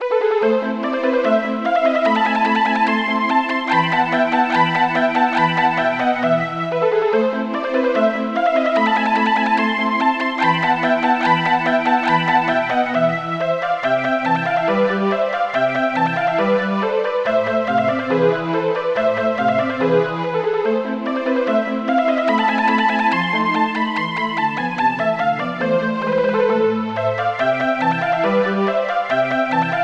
polysynth

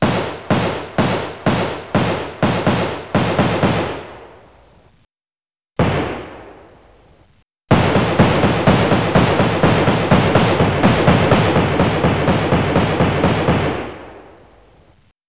gun shot

